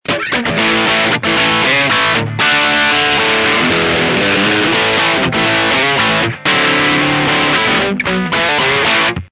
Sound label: bridge dirty humbucker; steel twin; axetec